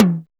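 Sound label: OB-DX TOM HI, machine toms; Drums In Your FACE!; OB-DX KIT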